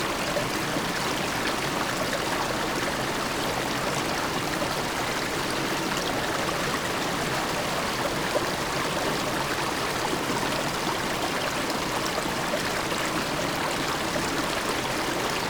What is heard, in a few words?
Water Rapids Heavy Flow